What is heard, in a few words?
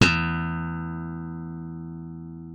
E2, slap thumb; Xsample VOL-1